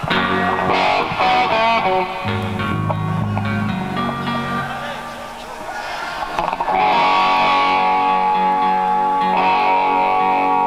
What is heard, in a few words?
tuning up; De Montfort Hall, Leicester